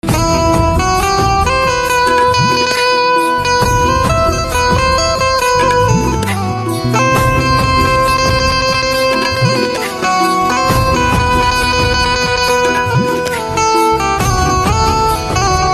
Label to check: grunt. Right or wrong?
Wrong